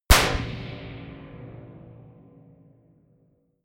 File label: gun slapping pop gun; schusswaffen